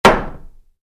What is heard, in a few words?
Wood hit wood, Wood, Light Impact: Wood; Materials; Stuart Duffield